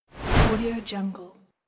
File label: woosh